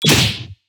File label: Slap, snare